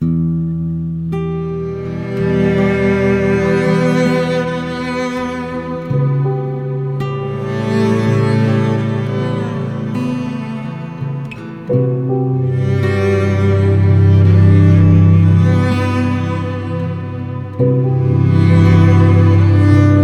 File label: A Worn Out Life With Cello